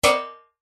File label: impact metal tray tin hit blunt med pitched resonate ring